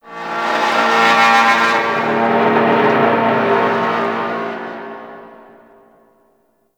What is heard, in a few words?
Ocean Long Metal Creak; Ambience; Warframe; Grineer Ocean